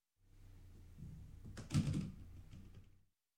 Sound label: Billiards Ball into Pocket; Sound Ideas 2000 Series